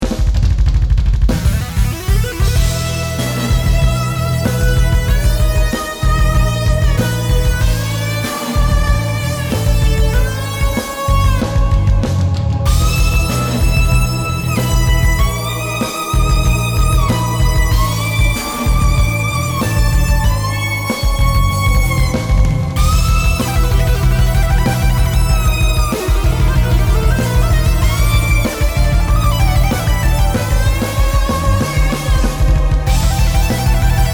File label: Solo